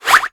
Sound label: Pullout Remote; Nicktoons Nitro; arcade